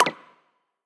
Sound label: message